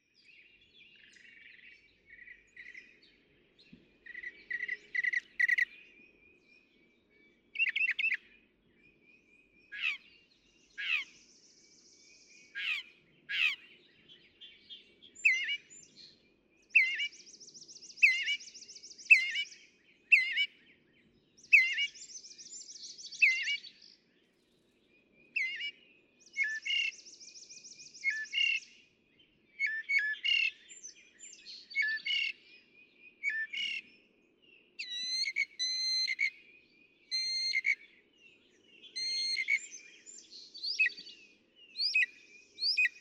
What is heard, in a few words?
Northern Mockingbird